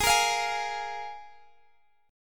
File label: F Minor Double Flat 5th, G sharp